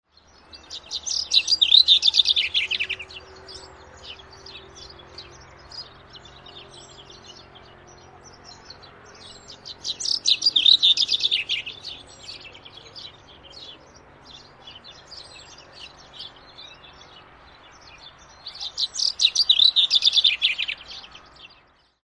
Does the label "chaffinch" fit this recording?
Yes